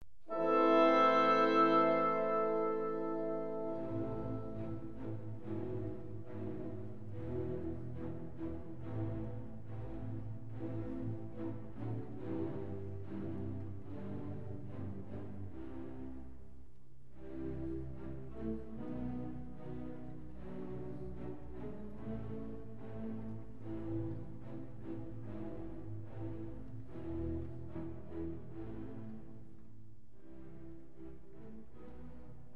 Verdrietig; Beethoven symf. 7 allegretto

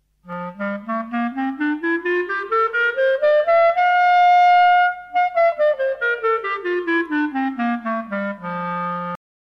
Houtblazers klarinet laag hoog laag

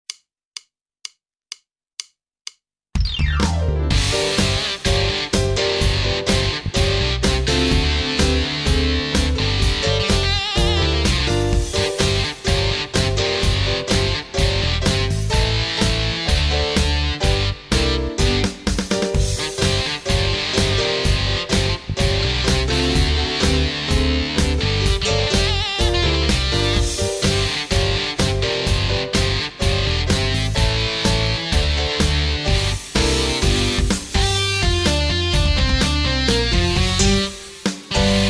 Begeleiding blokfluit instrumentaal; oude jan en jonge jan

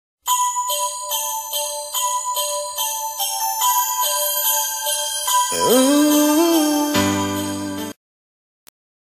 bellenboom

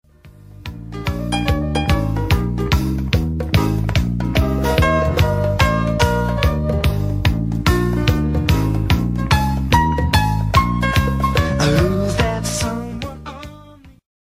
Pianovoorbeeld piano pop jazz

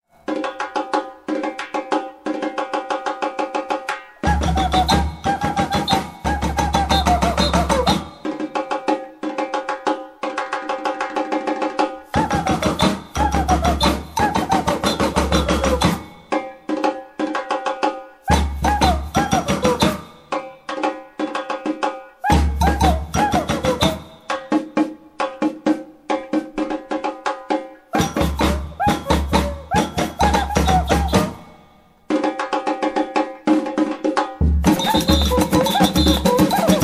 Naklappen samba instrumenten

